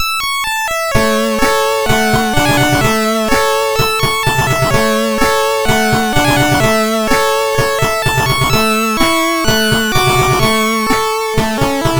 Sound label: Remix, sid MOS6581R4